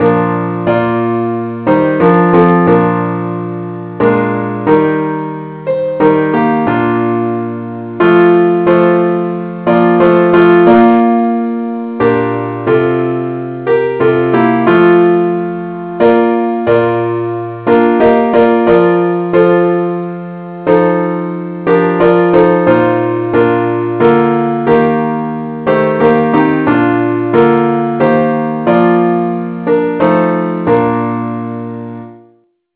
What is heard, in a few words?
partsonly keybes; i need thee